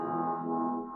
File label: Affirm